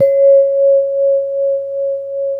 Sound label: VIBRAPHONE; ILIO